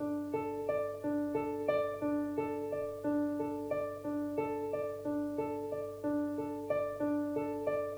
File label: piano, ambient